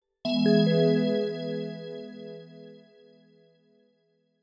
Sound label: announcement sound